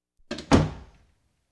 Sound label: Household Phones